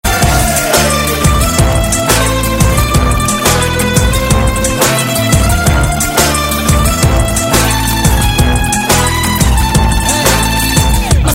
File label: spanish style tone ringtone